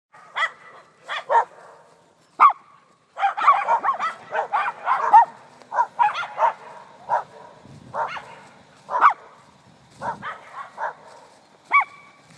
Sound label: dog chorus